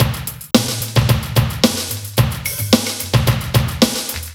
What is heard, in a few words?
Rock Tweak, Funk Beats; Sonic Foundry (Sony Creative Software); Synchro Funk